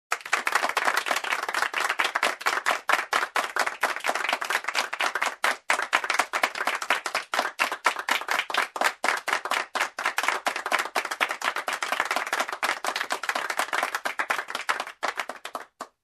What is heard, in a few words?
Close Room Applause